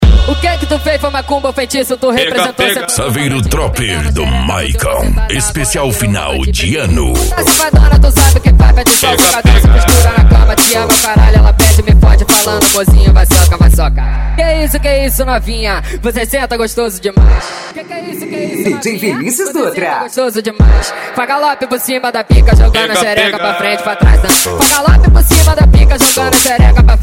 funk agressivo funk

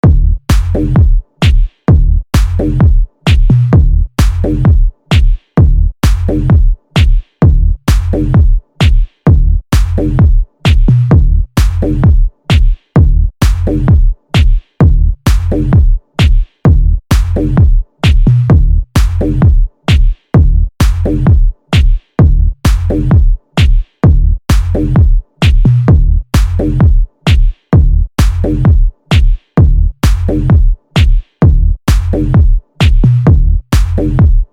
base mega funk tum dum dum mista classic house e deep house; pack bases mega funk 2020

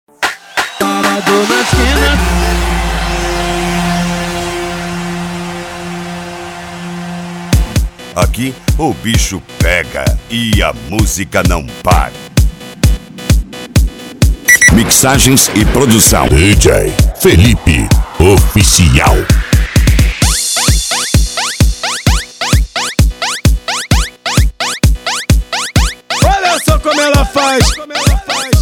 eletro funk pancadao

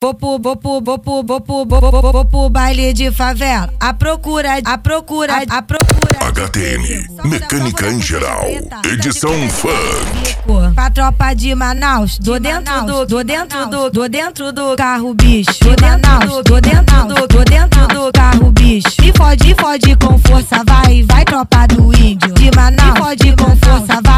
mecanica em geral edicao funk

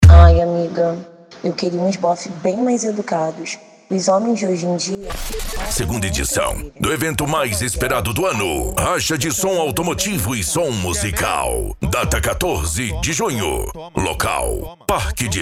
racha de som automotivo e som musical

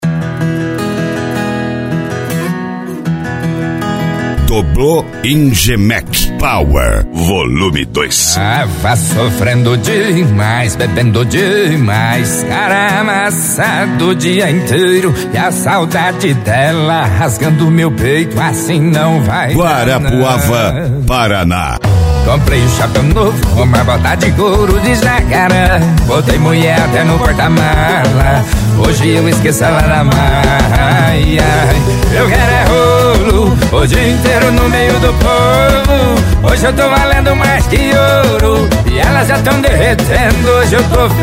sertanejo universitario e sertanejo pancadao

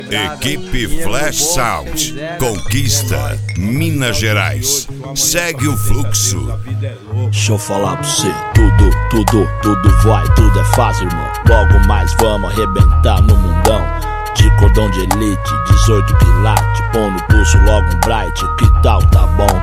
rap; cd rap flash sound

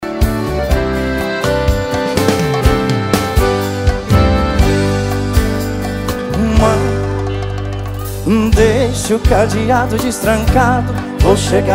sertanejo

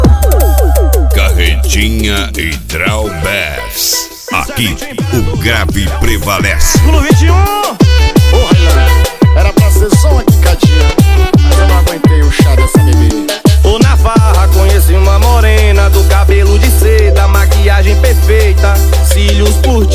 piseiro

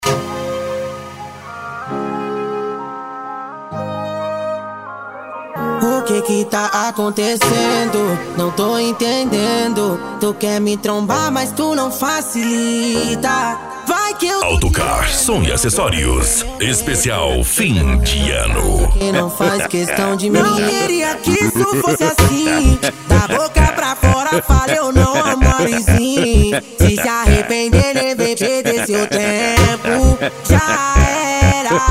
funk agressivo ritmado e funkadao

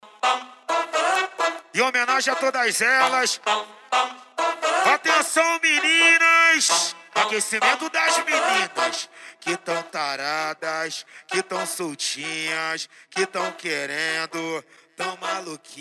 esp funk atack

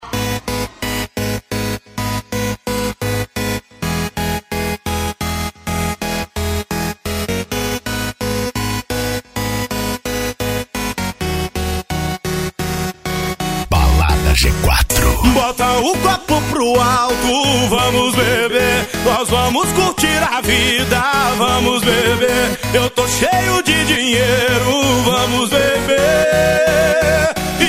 arrocha, sertanejo

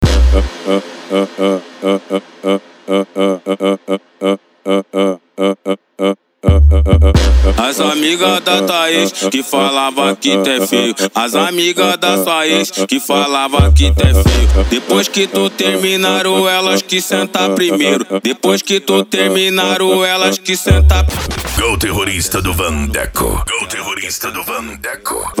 dance e funk mega bass

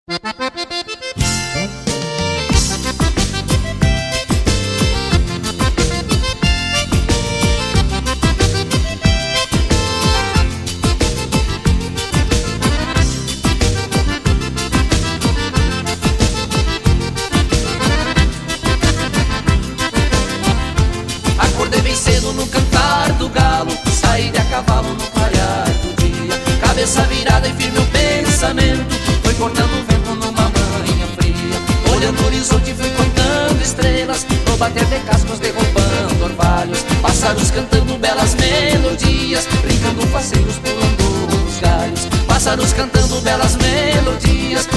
batidão gaúcho sem vinheta

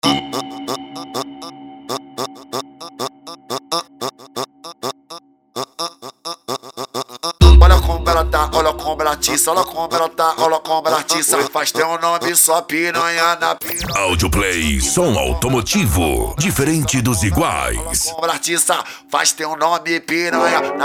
esp funk mega bass

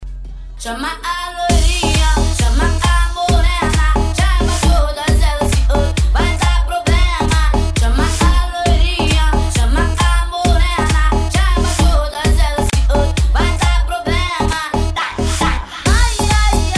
eletro e viola especial de eletro funk

